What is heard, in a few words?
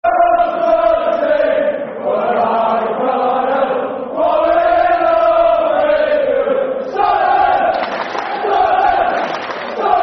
Football Chant (Soccer Song); Sunderland; Sunderland Safc